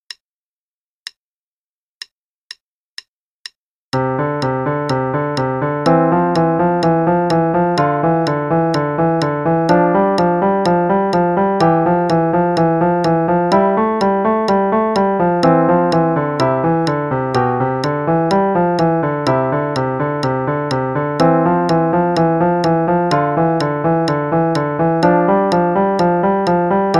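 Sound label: Play-a-long, Exercise, quarter note=126; Kohler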